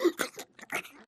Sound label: spectre chest punch idle grunt; Titanfall 2